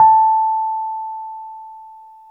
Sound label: RHODES EP2 A4; Sonic Reality; Vintage Timetraveler